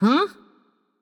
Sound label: nv confused; smite; voicepack; morgan le fay